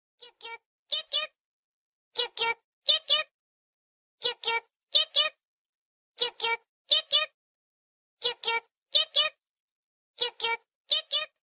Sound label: pinguin ringtone; mawaru penguindrum